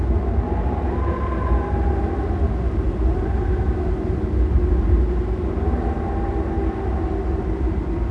demon wind; quake2

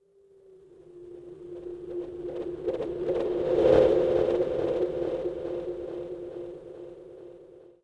eerie; quake2; chaos

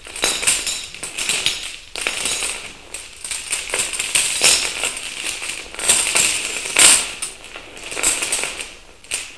chains; quake2; kitsune

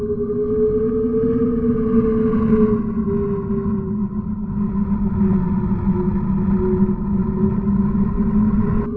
wind; quake2

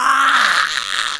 death; quake2; drfaustus

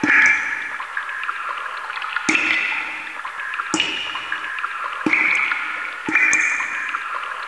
cave; quake2; chaos

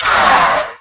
gurp; quake2; bauul

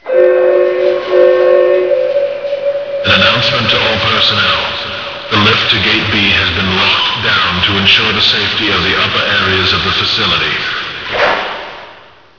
gate; quake2

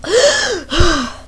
gasp; quake2; buttercup

